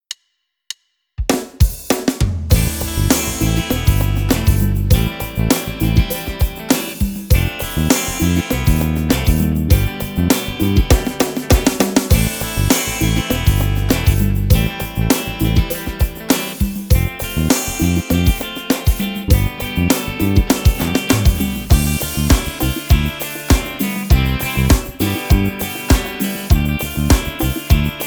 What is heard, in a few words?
Vintage Vibe